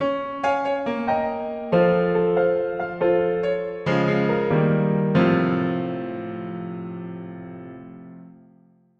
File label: Jingle